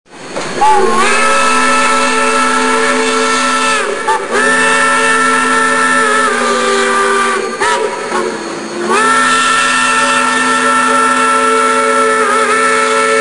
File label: whstl